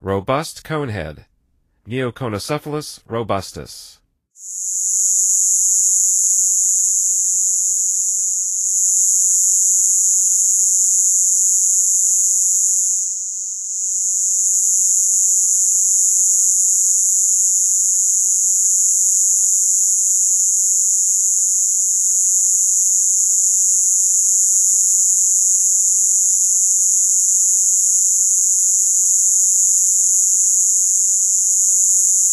Robust Conehead, Songs of Insects

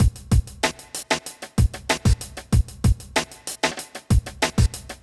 kit; Sound Effect Library

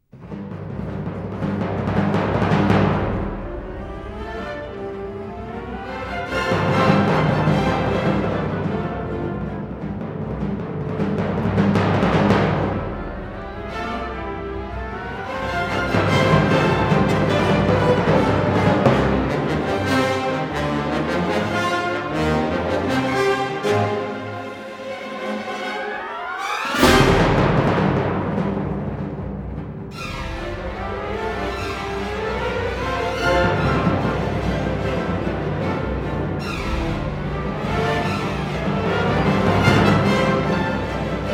Presto; Elgar; Enigma Variations; VII- (Troyte)

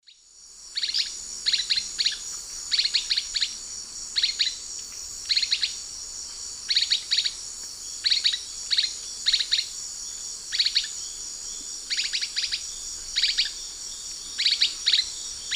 Schistocichla leucostigma, calls pair; S. America; Eastern Lowlands

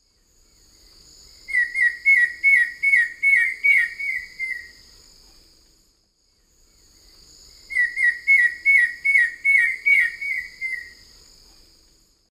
Myrmeciza melanoceps, duet; S. America; Eastern Lowlands

